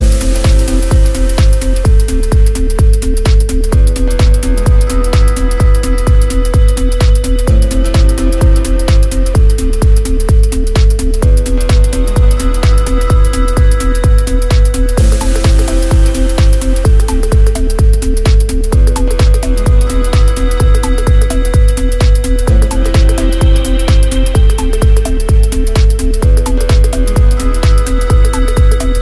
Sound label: nightcore